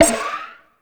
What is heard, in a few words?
UI Menu Invalid; Warframe; Follie